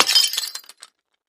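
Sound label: Craft Vase Type 4 Crash, housewares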